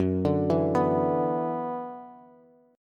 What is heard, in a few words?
Gb 7th Suspended 4th Sharp 5th Gb down Bass; arpeggiated; JGuitar